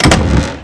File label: foot button; objects